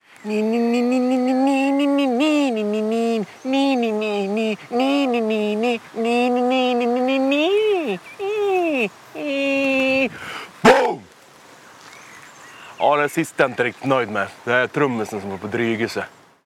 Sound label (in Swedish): gitarrsolo; Ringsignaler